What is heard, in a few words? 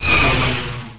fly; XWINGTIE